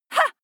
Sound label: female shout attack short ha; schreie; menschen